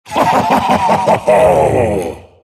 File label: silt laugh; dota; siltbreaker